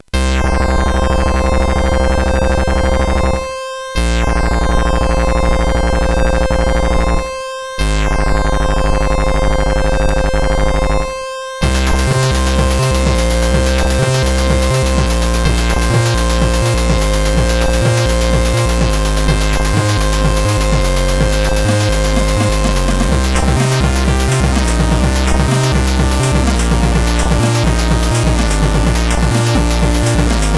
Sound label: Remix sid CSG8580R5; Ocean Loader 4